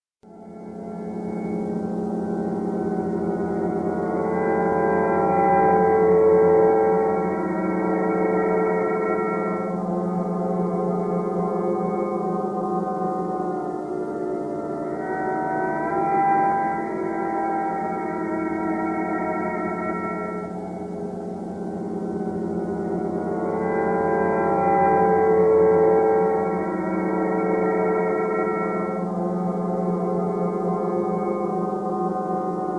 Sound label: ambience